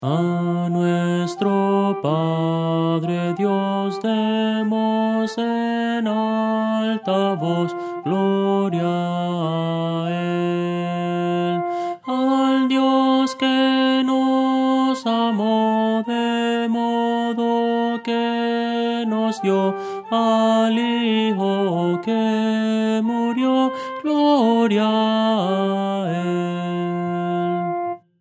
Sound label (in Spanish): melodia; a nuestro padre dios; Voces para coro; Soprano